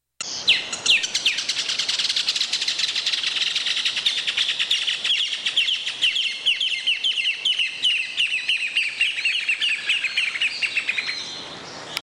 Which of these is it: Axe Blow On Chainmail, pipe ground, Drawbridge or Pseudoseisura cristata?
Pseudoseisura cristata